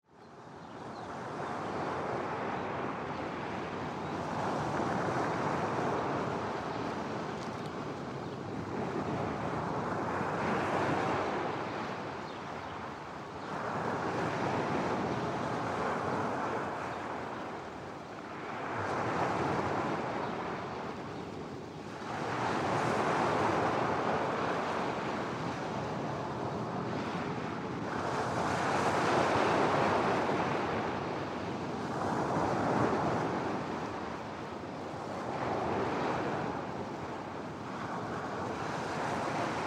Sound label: Waves Birds